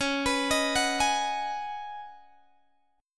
C sharp 7th Suspended 2nd Suspended 4th C sharp down Guitar Open G